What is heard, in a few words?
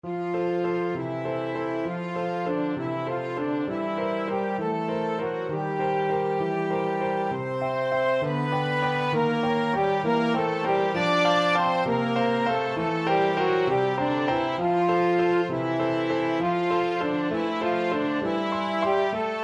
waltz, flexible unison